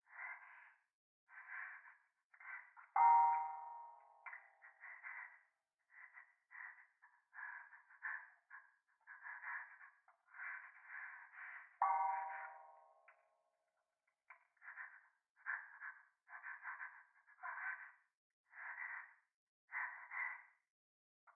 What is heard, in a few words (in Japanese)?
トイレ 右耳快感吐息 寺